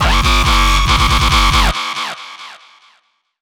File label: dubstep club bass